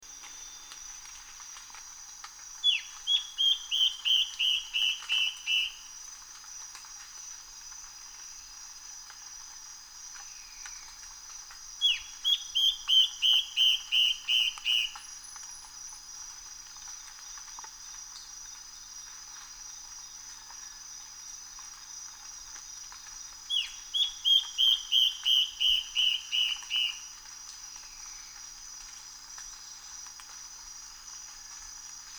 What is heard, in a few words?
Yellow browed antbird; صدای پرنده مورچه مرغ ابرو زرد